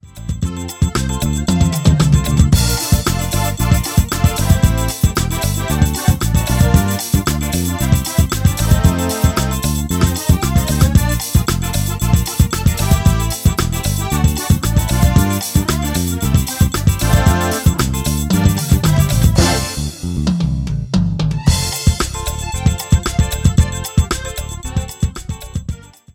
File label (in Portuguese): amostra forró